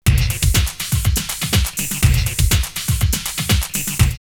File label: Body Beat 122, drumloops Techno&House; X-Static Goldmine